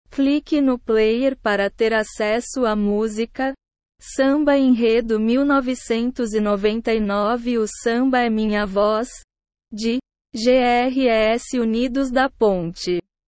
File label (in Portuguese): samba enredo